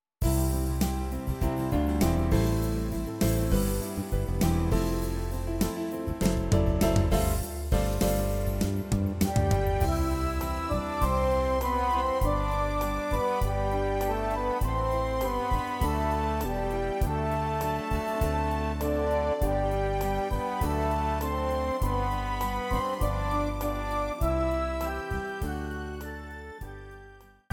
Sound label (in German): harmonie d